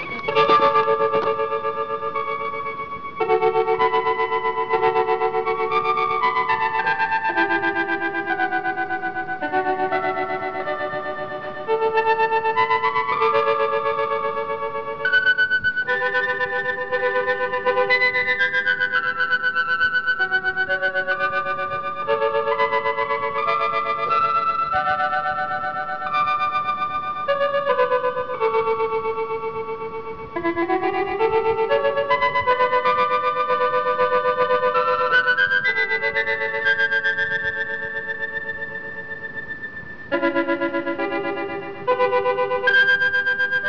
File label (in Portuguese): orgao